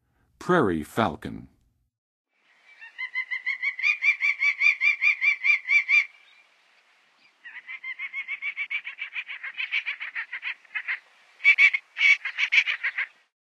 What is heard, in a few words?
Prairie Falcon, Birds of North America; Western USA; Western USA Songbirds